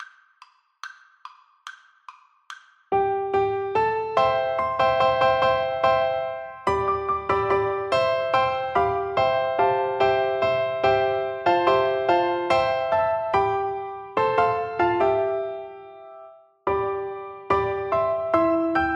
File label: Piano Duet